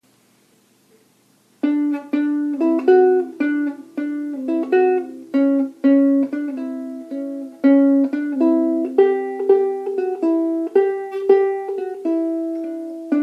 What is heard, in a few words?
riff, ukulele